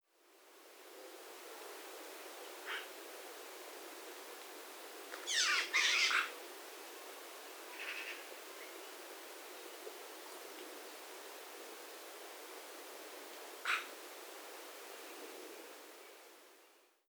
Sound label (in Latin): Pica pica; SETE